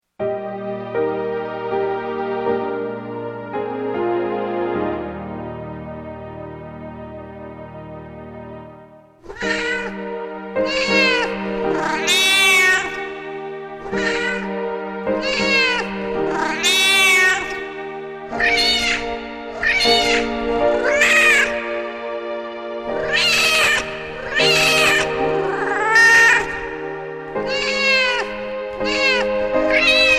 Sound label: silent meows